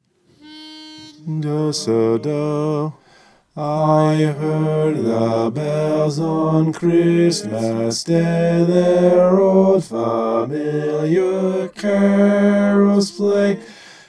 i heard the bells bass

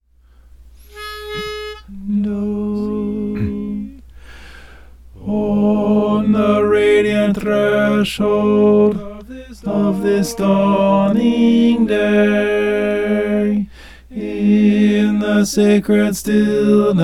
tenor

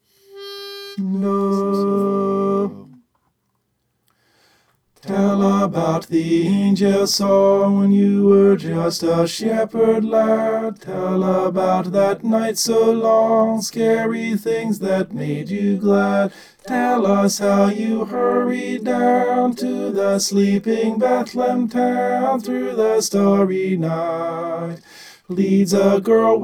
tenor

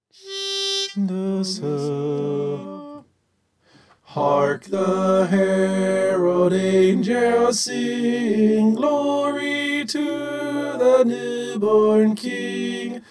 hark the herald soprano

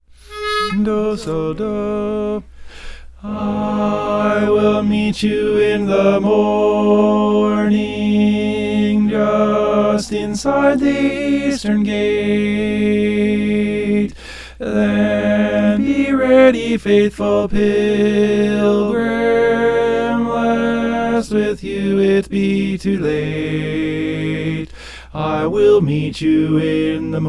tenor

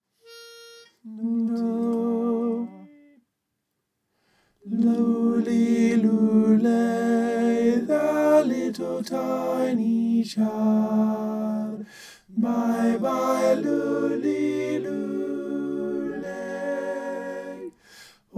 tenor

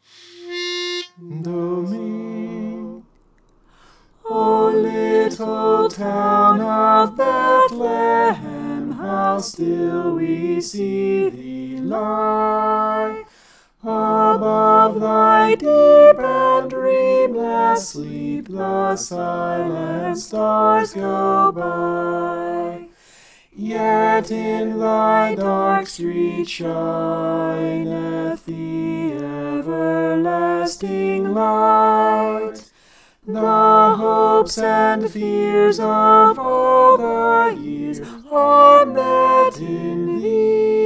soprano

